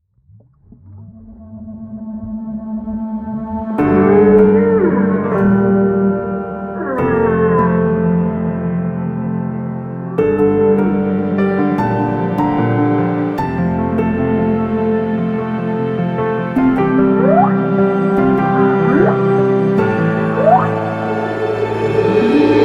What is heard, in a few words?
whales theme; huawei